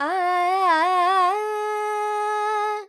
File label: Dorian, Vocals Carnatic Female Alaps 70 G Dry